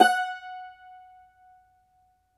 cumbus saz F#, plucked strings; E-MU Producer Series Vol. 7 – Old World Instruments

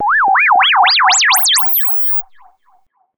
fx creep, Noise

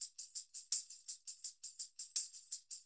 SF LOOPS 84, Percussionworks; Sampleheads; NYC Percussionworks

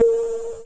CRASH, Dance Mega Drums; Best Service; CRASHES

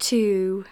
Female vocals and robo-vox

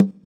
Conga; Equipped Music; Loungin House

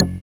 SYN DANCE; Sound & Vision - Gigapack II

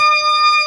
B3 E 4, ORGAN; VOCAL ORGAN; ORGAN #2

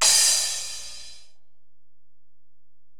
Ride, Alesis DM-PRO

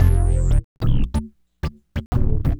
Funk Riff

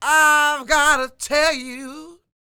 SING, Vocal Planet Blues Soul BLUESY

